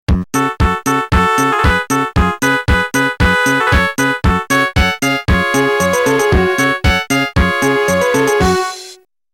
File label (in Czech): melodie